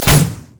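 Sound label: minefield explosion; LoL; ziggs madscientist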